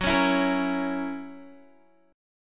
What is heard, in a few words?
Gb 7th Gb down Guitar; Listen to Gb7 strummed